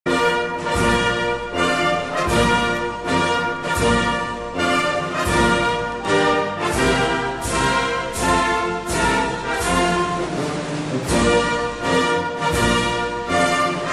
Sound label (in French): MARCHE CONSULAIRE